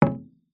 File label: instrument drum large gamelan hit single